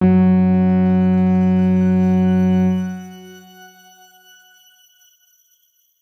X Grain F#2 mf; healing soundscapes; HSS Ableton Pack1

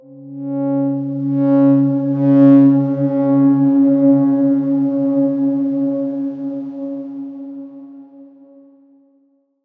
Darkswarm C#3 mf; Ableton; healing soundscapes

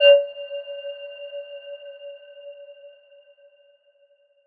Aurora E5 f; healing soundscapes; HSS-Custom-1

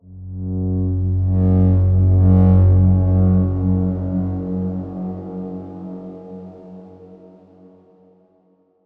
Darkswarm F#1 f; HSS Ableton Pack1

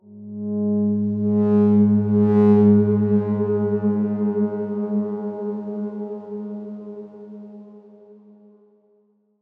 Darkswarm G#2 f; HSS Ableton Pack1

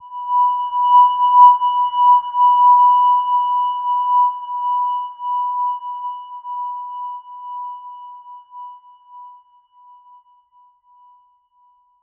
Silver Gem B5 p; healing soundscapes

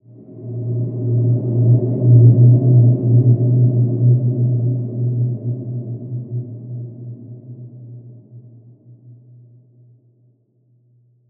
Large Space B2 f, healing soundscapes

